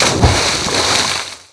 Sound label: jump water; people; Pirates Of The Caribbean